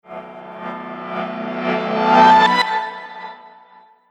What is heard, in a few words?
Horror Piano Tremble, Piano Sound Effects